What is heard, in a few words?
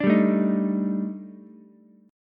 Eb Major 7th Flat 5th, Ukulele; EbM7b5; strummed; JGuitar